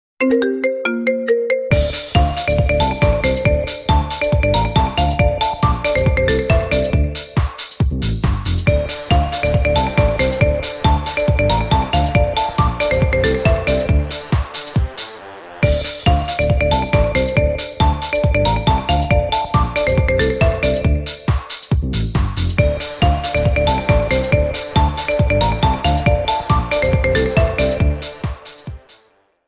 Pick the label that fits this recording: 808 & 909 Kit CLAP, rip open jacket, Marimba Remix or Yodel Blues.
Marimba Remix